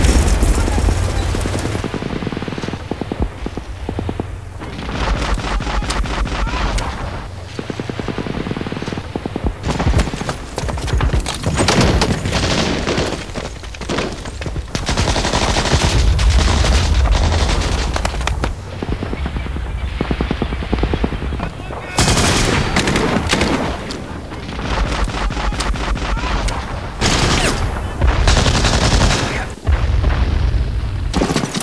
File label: ambience; dod